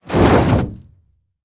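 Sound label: bulk movement landing; Smite; Cabrakan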